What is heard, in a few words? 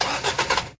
Truck Starter; DCS